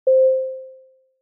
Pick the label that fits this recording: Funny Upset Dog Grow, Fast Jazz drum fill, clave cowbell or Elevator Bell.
Elevator Bell